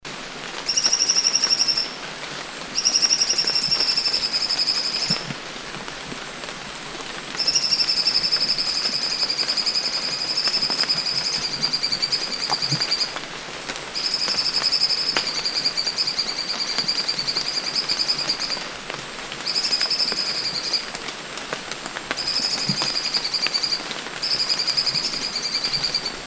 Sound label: Fork Tailed Sunbird Ssp Latouchii Song; souimanga de christina aethopyga christinae ssp latouchii; Guangxi Da Yao Shan